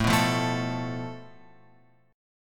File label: A Suspended 2nd Sharp 5th; Asus2#5 Chord {5 2 3 2 X 5} strummed